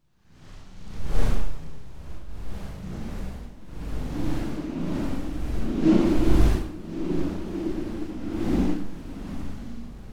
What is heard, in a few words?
Wind Gusts Cold Heavy Blizzard - Lower; Hollywood Edge; sound designer's toolkit v2